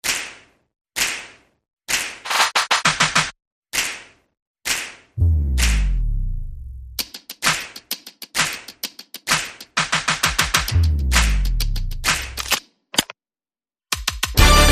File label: Light Percussion